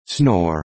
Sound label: snore